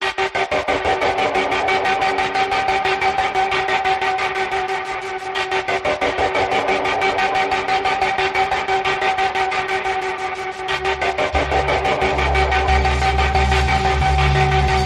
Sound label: Cosmic Synth Pulse